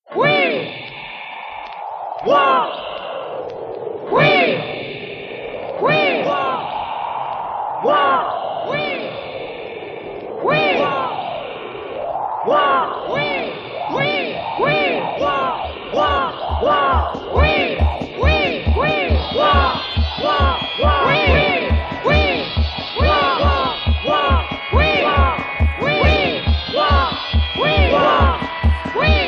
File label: Jingle